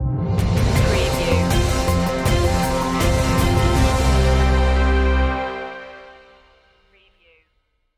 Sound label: informative news stinger